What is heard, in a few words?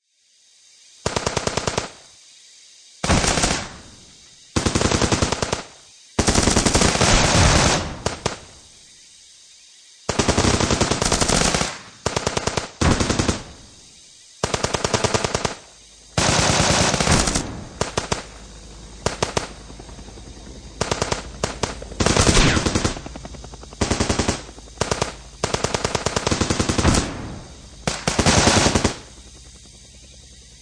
Warfare Modern War Battlefield Jungle Fight; Vietnam; Battlefield Sound Effects